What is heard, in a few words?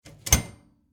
Household Vent Close Metal; Cleaning